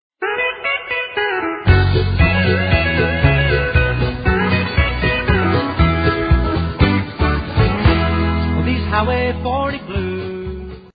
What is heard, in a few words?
blues